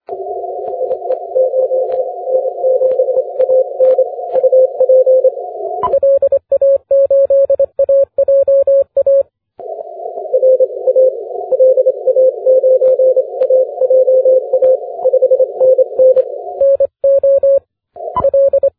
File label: 17m cw